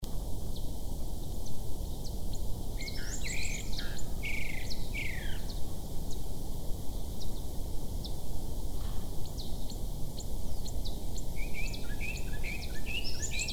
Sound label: Pinchaflor Ferrugíneo Diglossa sittoides